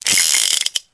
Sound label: Revolver Spin; Weapons